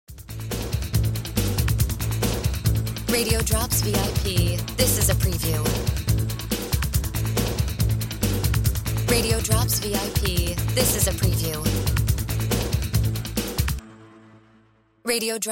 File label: No FX Music Bed